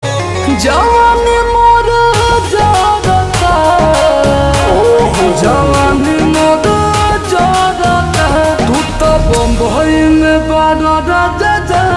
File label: Ringtone slowed reverb